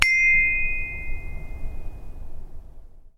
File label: chinese bronze bell front; sackler